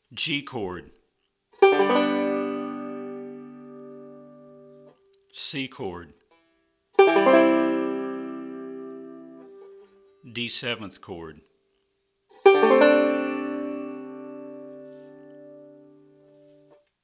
Chords

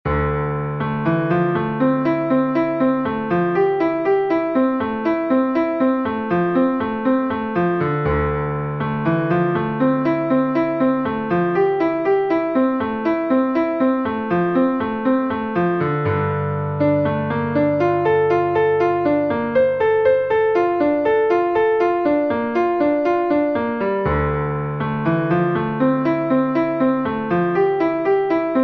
Jazz Exercise

